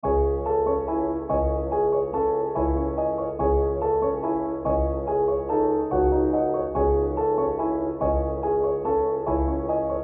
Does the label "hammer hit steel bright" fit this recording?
No